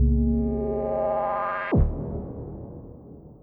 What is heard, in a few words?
Swapper Atonal